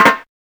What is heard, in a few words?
snare, drums